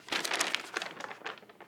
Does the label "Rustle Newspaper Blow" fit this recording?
Yes